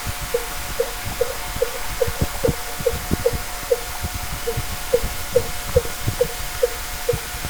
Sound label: fin whale